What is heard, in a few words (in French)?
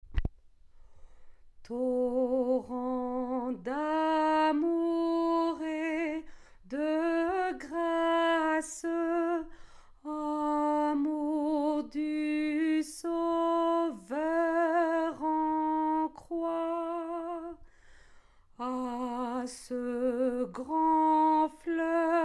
ALTO, atelier de chant a plusieurs voix